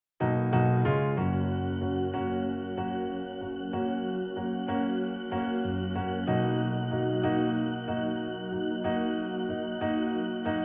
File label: karaoke